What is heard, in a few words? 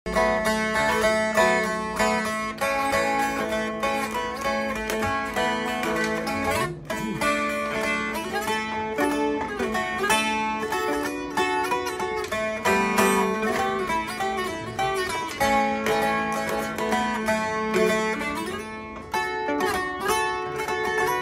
Appalachian dulcimer